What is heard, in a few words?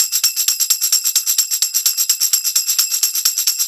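PIN TAMB, 130 bpm; USB Soundscan vol.32; Roots Disco; AKAI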